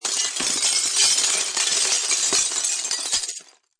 Debris Glass, Glass debris falling, smashing. Multiple small glass fragments; break, rattling.